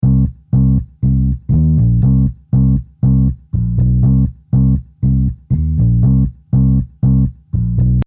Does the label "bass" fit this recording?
Yes